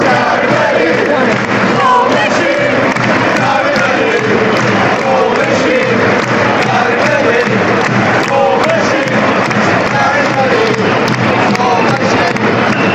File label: goal machine, fanchants; gary madine